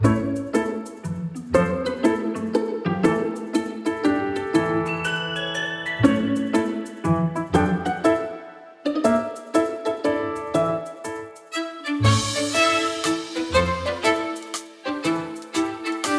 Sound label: Idle Ambience; Honkai Impact 3rd; Dreams and Laments of the Forgotten Stars